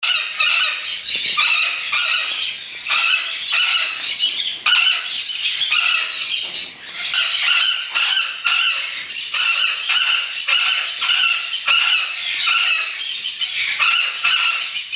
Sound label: Great Billed Parrot call